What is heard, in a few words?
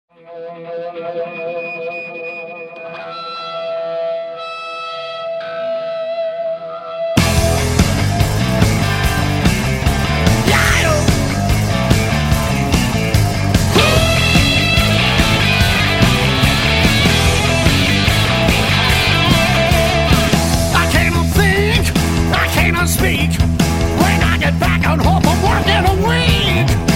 Feedback And Distortion